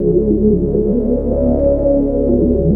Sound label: BASE, ELECTRONC